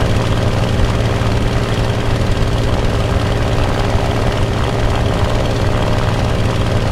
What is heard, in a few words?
me109 cockpit, engines; War Thunder